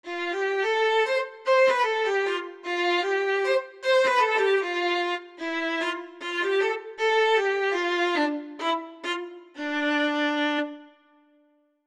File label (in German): Alpine Volksmusik Geige